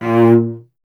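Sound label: strings cello A#; Miroslav Vitous; Solo Instruments VOL-1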